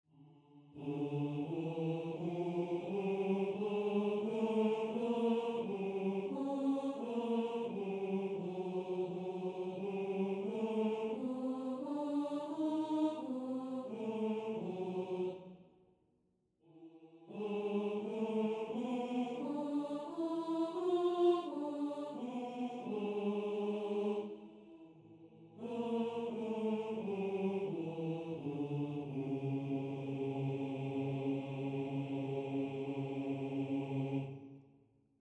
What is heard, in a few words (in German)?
Tenor